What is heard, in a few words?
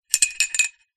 small metal object coin shake inside metal pole; foley